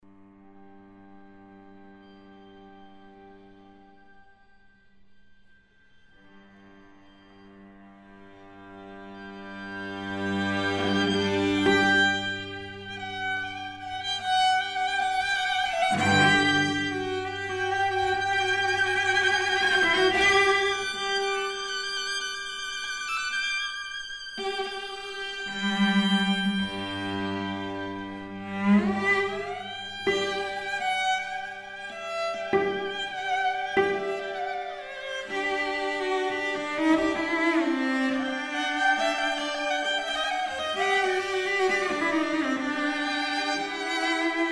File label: String Trio